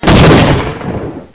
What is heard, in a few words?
explode, weapons